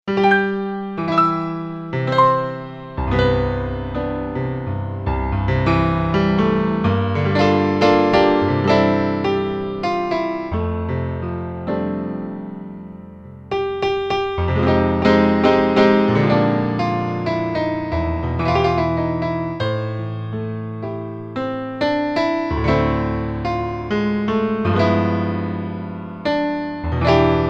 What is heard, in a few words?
Warm & Clear, Alesis ZA Stereo Jazz Piano